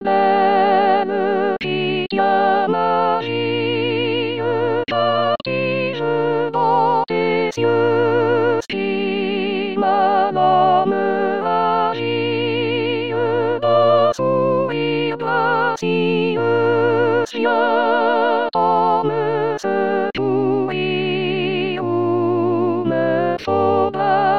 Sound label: soprano; Arbeau; Pavane